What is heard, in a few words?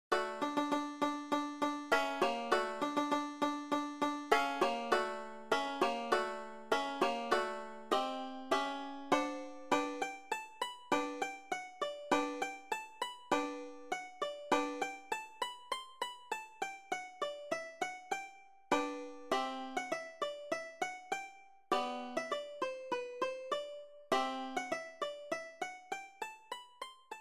Tenor Banjo